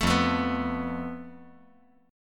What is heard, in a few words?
F Major Flat 5th